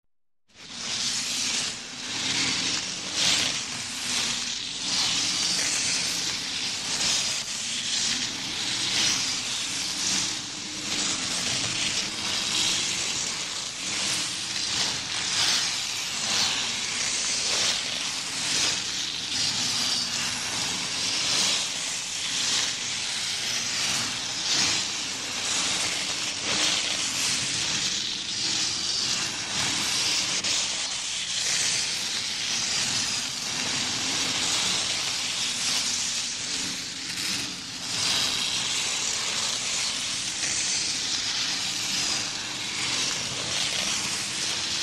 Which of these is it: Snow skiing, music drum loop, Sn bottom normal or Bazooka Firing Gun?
Snow skiing